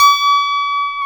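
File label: electric piano, hard; Roland L-CD701; Pop Pianos 2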